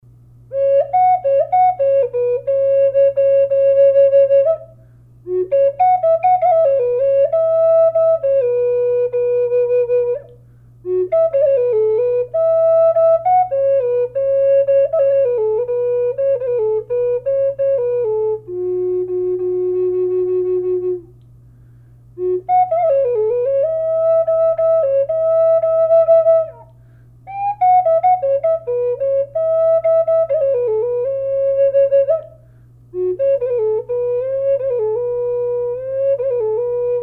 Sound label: f sharp, flutes; bcat; collector flutes